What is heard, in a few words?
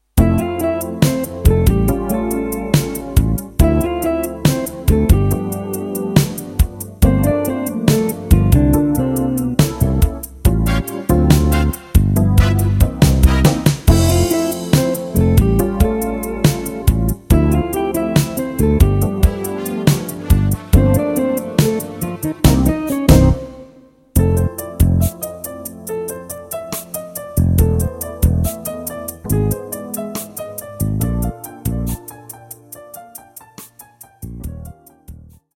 YAMAHA PSR S700